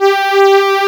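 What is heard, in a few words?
G3, SYNTHESIZER, analog STRINGS; AKAI S6000 CD-ROM - Volume 1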